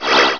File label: use object; hereticiidemo; player